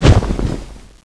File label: ghost attack; zmall; ZM-AMXX